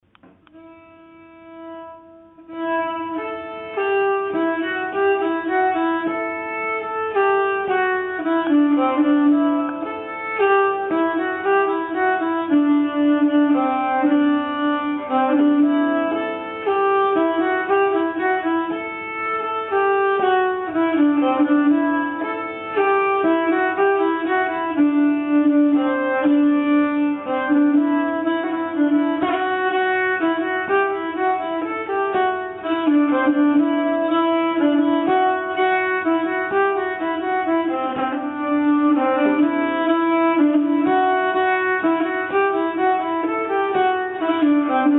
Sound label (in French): bourree Conscrits